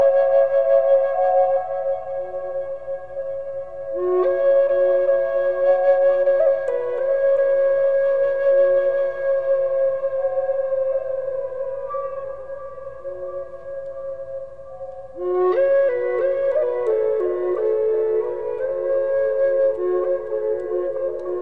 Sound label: native flute, Ringtones; Navratri Special